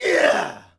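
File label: Axeman Attack Vox; Civ4 warlords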